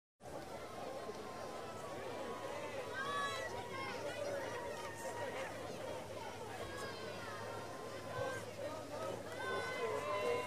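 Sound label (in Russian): шум толпы болельщиков на марафоне разговоры дудка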